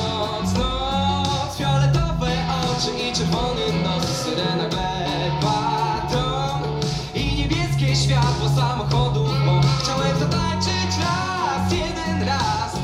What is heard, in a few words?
rock